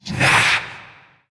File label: Possessed Red Veil Vocal Attack; Warframe; PriestQuest